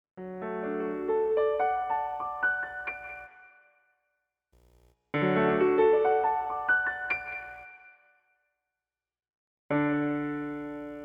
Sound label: Symphony in Raga Soolini